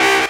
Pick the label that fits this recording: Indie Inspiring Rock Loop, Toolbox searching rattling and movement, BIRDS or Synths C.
Synths C